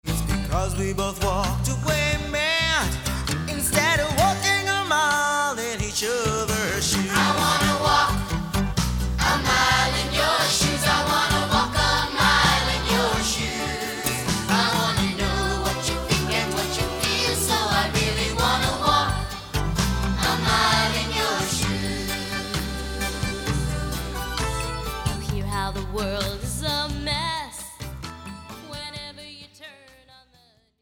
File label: walk; walk a mile